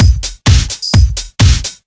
Straight Loop 128 BPM, House

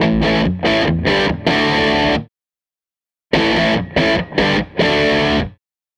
Les Guitar Loop

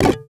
NYLON PERC; Spectrasonics; Heart of Africa 1; AFR.NYLON

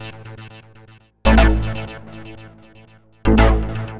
Bass, EDM; Sonic Foundry (Sony Creative Software); Afterhours EDM; Retro Active

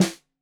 Snare 65 Reg Stereo, Deeply Sampled Drums

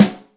RIM dry, Yamaha DTXpress Acoustic Snare

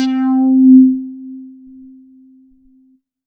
C4, Synth Line; 90 s Sample CDs; NiceBeats; SynthLine VOL-18

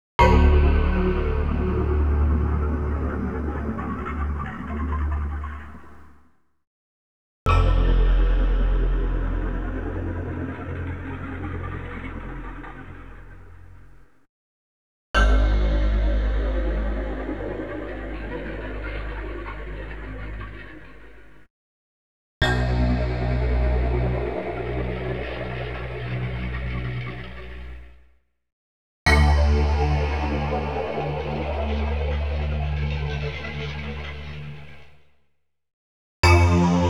Multi, Orchestral; Klaus Schulze Collection

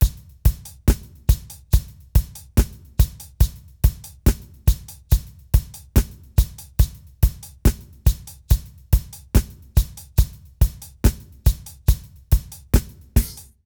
British SKA Loop 136BPM; Kaeru Cafe; British Reggae And Ska Loop

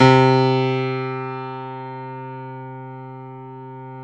PIA GRDPI, Roland; Sound & Vision; Gigapack I CD 2; PIA Grand 5

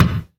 Percussions

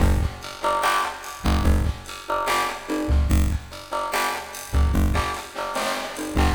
BOL, BOLERINO, Liquid Grooves; Spectrasonics; Liquid Grooves 1 & 2

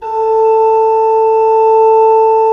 RFRP A3, Cathedral Organ, ROHRFLOETE; Propeller Island

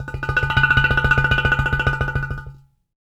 UDU, African; Supreme Beats; Spectrasonics; SB Udus

